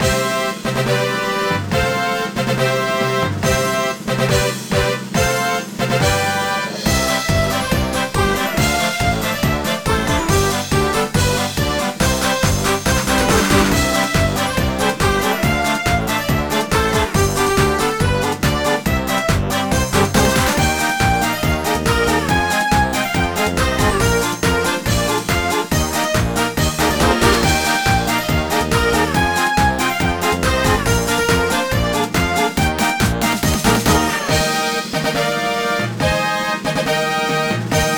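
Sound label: background music trial; Ice juggler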